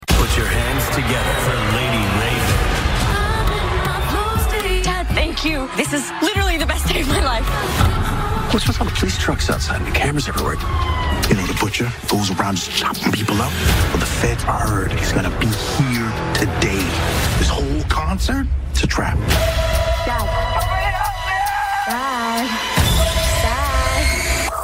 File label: trap